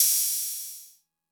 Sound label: hat; MFB-522